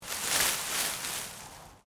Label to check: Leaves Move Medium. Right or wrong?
Right